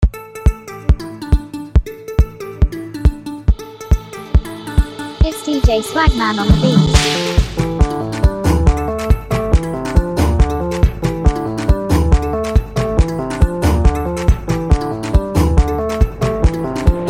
Jungle Mara Beat

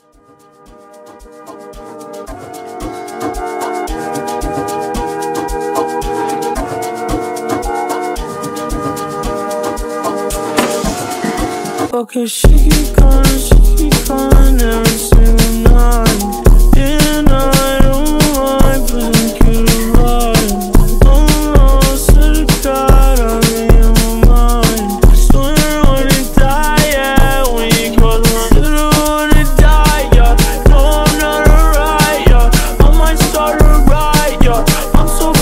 Amapiano Remix

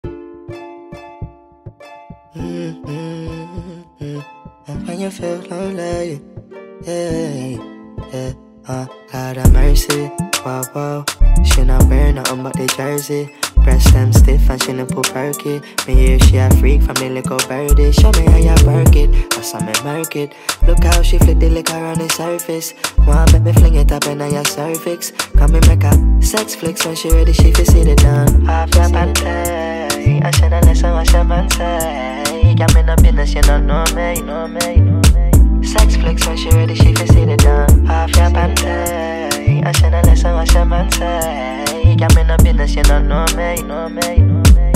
Sped Up

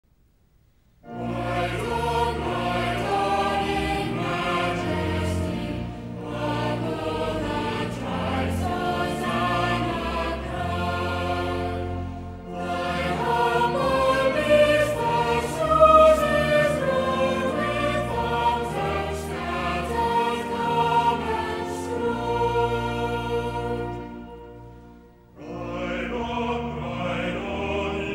Cathedral Choir; Salisbury

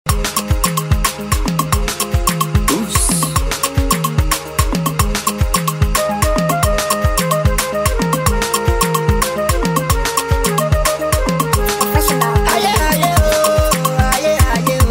Beat

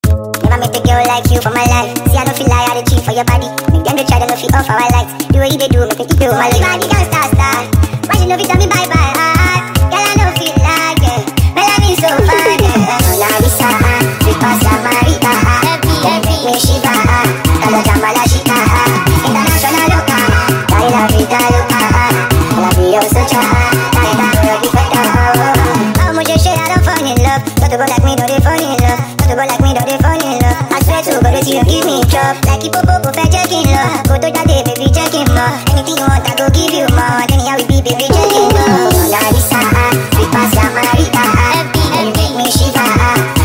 Sped Up

